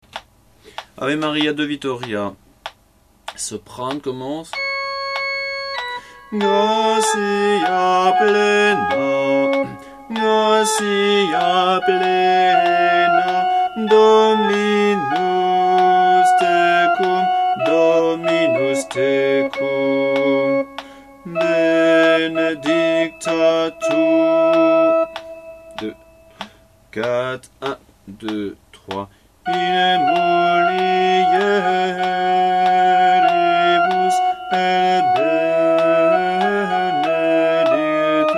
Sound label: alto; Ave Maria; Vittoria